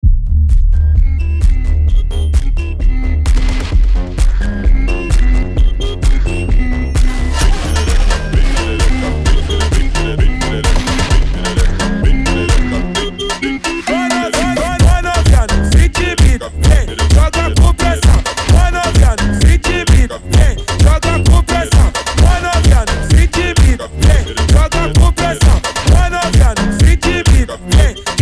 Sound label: funk